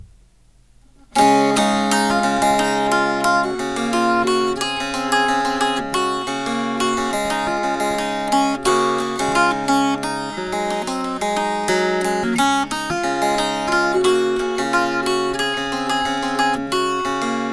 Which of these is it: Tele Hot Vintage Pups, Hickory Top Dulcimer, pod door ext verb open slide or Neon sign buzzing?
Hickory Top Dulcimer